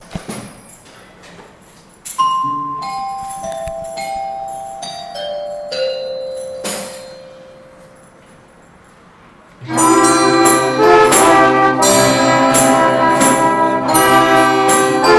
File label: orchestra